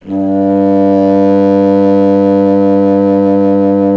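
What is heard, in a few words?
wind contrabassoon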